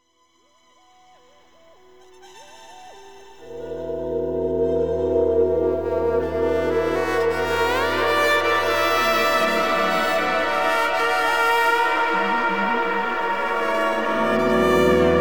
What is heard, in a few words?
Tone